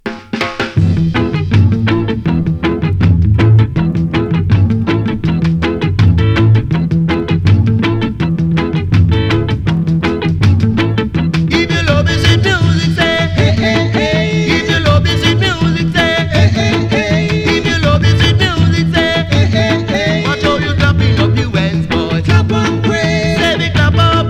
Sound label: Lu-Lu Bell